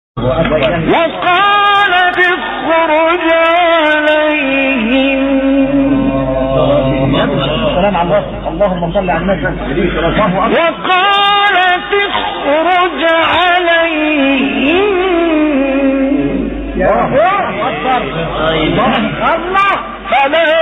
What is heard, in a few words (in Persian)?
تلاوت فرازی از سوره یوسف; استاد مصطفی اسماعیل